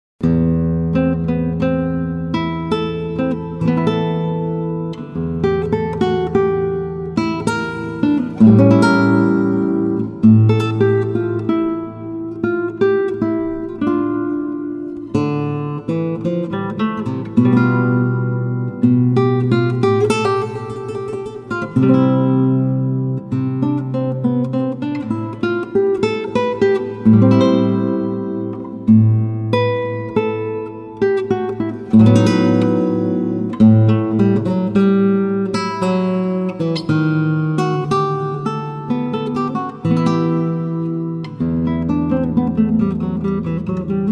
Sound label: Bach Lute Prelude In A Minor